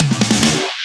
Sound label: Loops Fills; Vengeance Samples